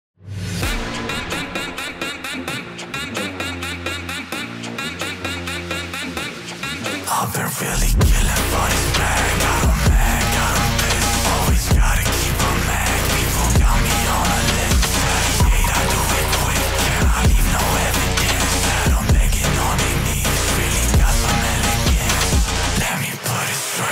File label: brazilian phonk remix